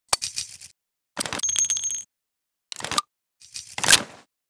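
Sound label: revolver reload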